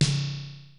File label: 505 Drums, Roland TR-505